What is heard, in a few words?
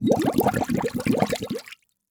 Liquid Water Bubbling In Metal Bowl Multiple